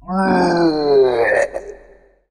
death; cursedtemple

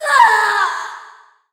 Storm Fiend death; TormentaIsland

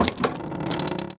treasurebox open

